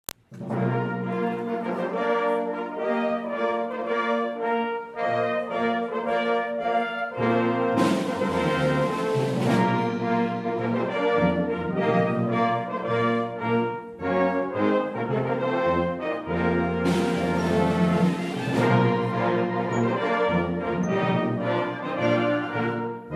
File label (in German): Fanfare Festive